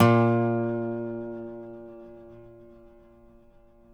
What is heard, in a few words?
DOBRO NOTES; Country